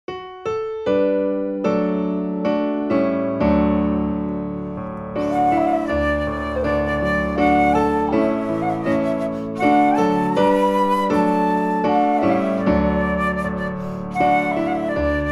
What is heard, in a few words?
flute; come thou fount